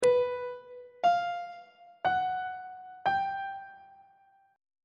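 b diminished 5th perfect 5th augmented 5th intervals on treble clef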